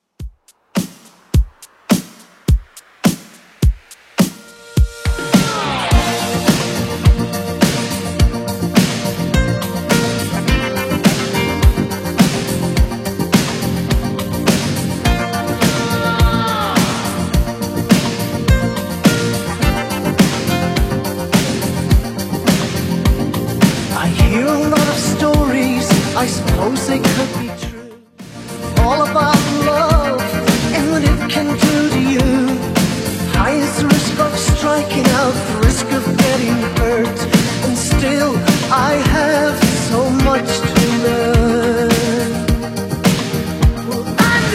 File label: 80s Redrum 105bpm